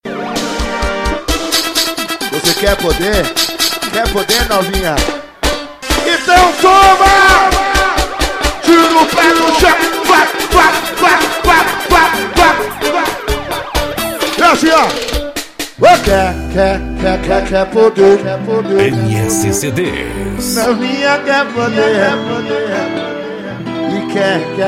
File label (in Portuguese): banda ao vivo; promocional de junho